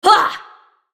spell cast effort; Leona

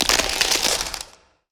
ring of frost; LoL; lissandra

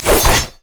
double strike

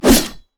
basic attack claw; rengar

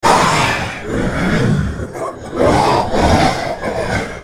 dying; Nocturne

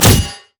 rampage; LoL; hecarim

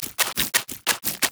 spider emote dance; LoL; elise base

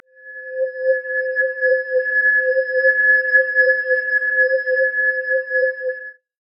lantern beam; LoL; thresh

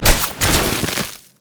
snowday minefield land; LoL; ziggs snowday

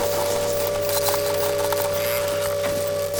rumble shield

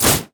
basic attack; LoL; ahri

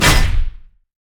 basic attack; nautilus

